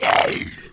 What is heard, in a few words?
Lizard Man Attack; Playable Tutorial Level; TR3